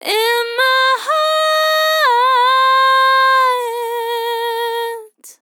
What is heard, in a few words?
LYRICS Fills 120bpm Fm